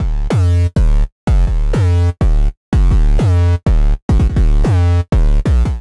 Sub D Minor 165 bpm, bassline; MIDI Clips; Tonal; Dune Buggy; Skitter and Step; Ableton Factory Packs

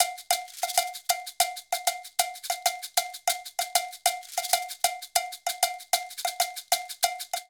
Bembe 6 8 Shaker Cowbell-128bpm; Latin Percussion; Ableton; Factory Packs

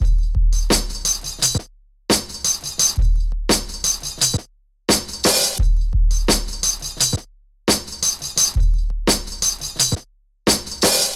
Flute Drums; Ableton; Breakbeats by KutMasta Kurt

